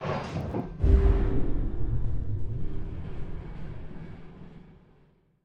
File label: Ship Fly To Planet; Lethal Company